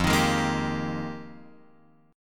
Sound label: F Minor 11th Guitar Open F; Fm11; strummed; JGuitar